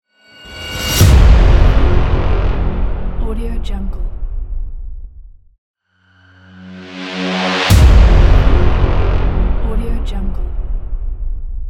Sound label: hollywood trailer impact pack; phillipmariani